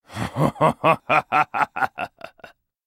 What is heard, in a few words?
RNB